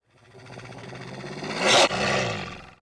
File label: wolf